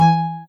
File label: soft hitwhistle; osu